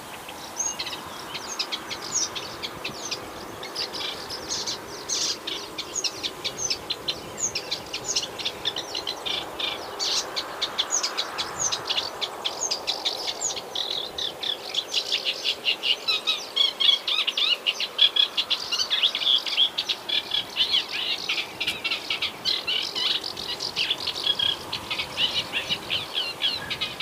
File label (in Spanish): Carricero común Acrocephalus scirpaceus